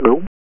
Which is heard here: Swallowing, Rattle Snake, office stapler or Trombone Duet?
Swallowing